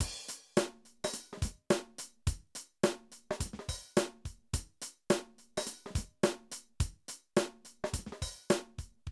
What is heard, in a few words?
Drum loops, 70s funk